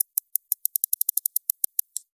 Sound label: pitched quick clicks; Hızlı tık